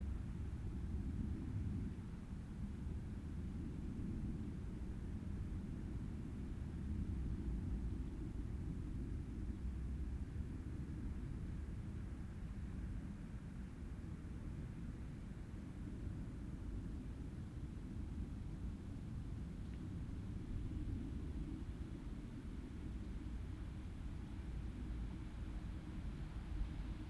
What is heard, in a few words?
yamanakako sound